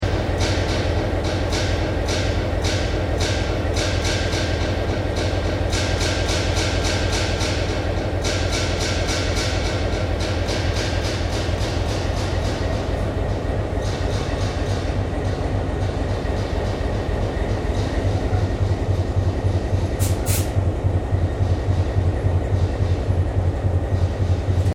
Train T Class Diesel Locomotive Idle Extra Noise; VICRAIL; Various Melbourne Trains; Vehicles

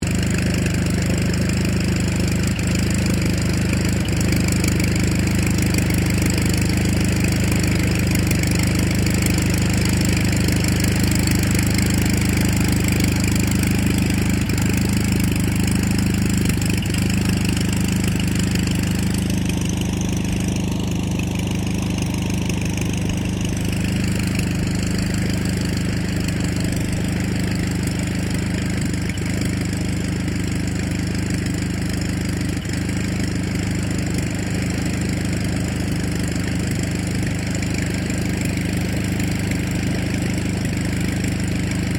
1939 RYAN STM-S2 IDLE; Airplanes; Vehicles

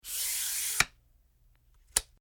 Tri-Pod Leg Extension Foley; Scrape; Scrapes

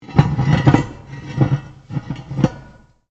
METAL ON METAL LARGE SCRAPE; Materials

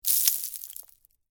Debris Drop Penne, Rigate; Texture; Debris Sound Effects; Materials

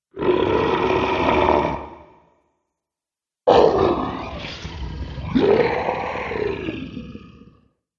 Dragons, monster; horror

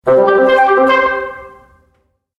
Flute Transition; Transition Sound Effects

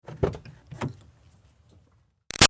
Car Highlander Door Open Light; 2005 Toyota Highlander V6; Cars; Vehicles

